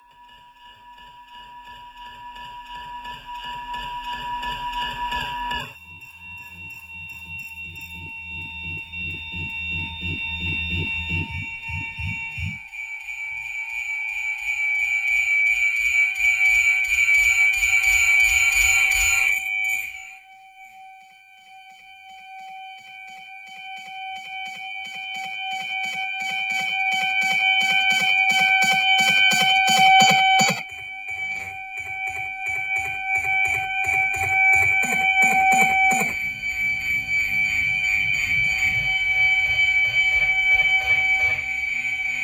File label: texture tinitus